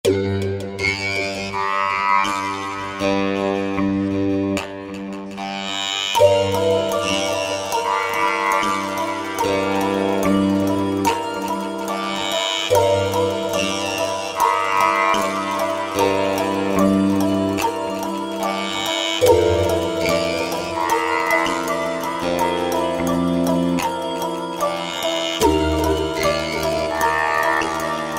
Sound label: Roland V-Synth Pluck Morph; Factory Patches